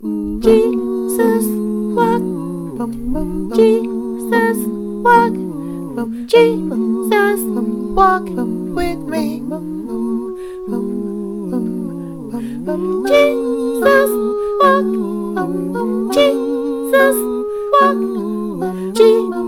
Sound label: SOPRANO